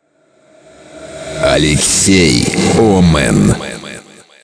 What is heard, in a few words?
Jingle